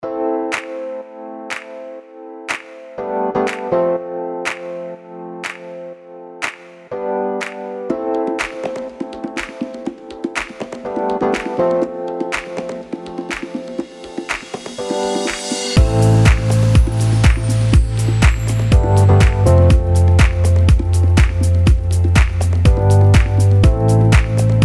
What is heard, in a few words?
Upbeat Fashion Corporate